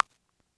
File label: Bongo Snap; Lost Tapes From Mars